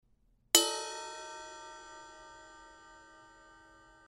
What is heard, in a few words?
ZILDJIAN 21 PROJECT 391 RIDE